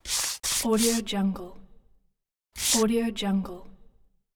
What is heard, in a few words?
brush